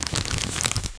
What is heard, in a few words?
paper bag crumpling